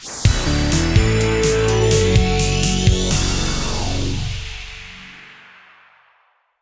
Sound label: outro Free Spin; netent; neonstaxx